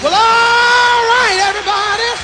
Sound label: "well allright everybody", MALE SINGING